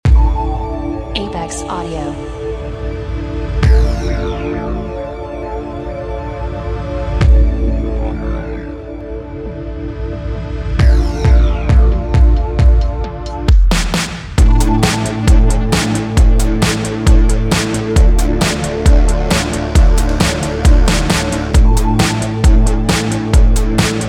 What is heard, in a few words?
Drift Synthpop and Retrowave